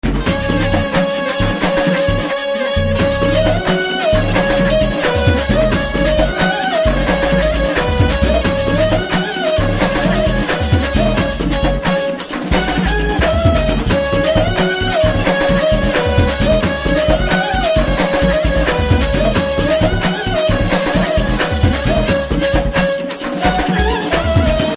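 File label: background music Ringtone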